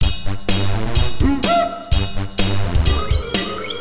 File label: thinkingtime